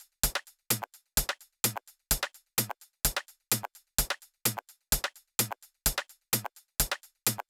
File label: 128BPM Drums; Sunday; Vengeance Samples; Sunday Kit